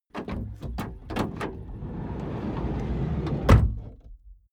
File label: car slide door close